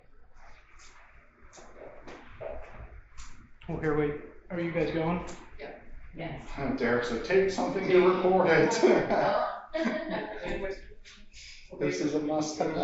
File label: 2nd floor open space EVP; caliente pizza draft house crafton investigation